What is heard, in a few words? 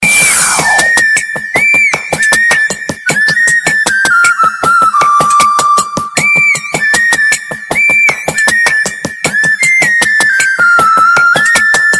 flute